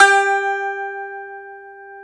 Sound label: Baglama G3; EMU Planet-Earth